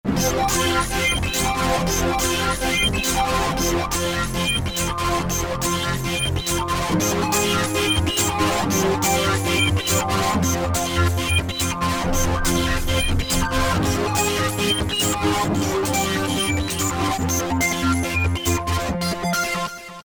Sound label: Korg MS2000; Factory Patches